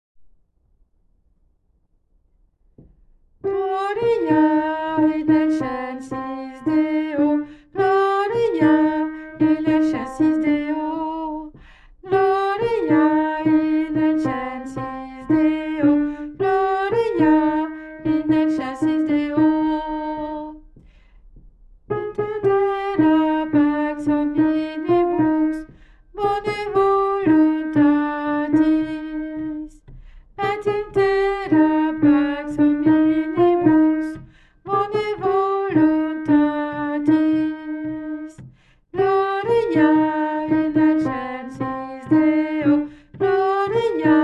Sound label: Gloria alto